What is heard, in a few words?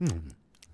Mmm Vox; Civ4 warlords